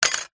glass shard from broken picture frame drop not break; foley